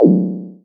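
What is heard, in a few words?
electronic blup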